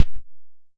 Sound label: Kick - Dull Attack; Tama TS-206